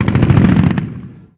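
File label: gun us assault medium; ModernWarfare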